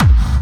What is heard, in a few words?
hard bass drumz